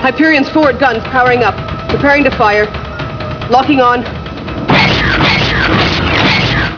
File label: guns